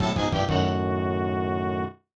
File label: Message From Bowser; SMAS; SMB3